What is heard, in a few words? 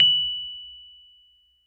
F#7, Rhodes Mark II; mkII 88 key HI; CEPCo Sample Series Vol. 3